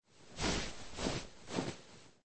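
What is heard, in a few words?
washing clothes by rubbing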